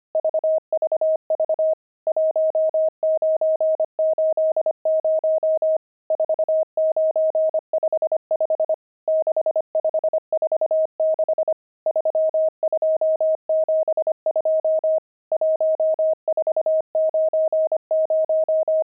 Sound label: pangram, intermediate pangrams files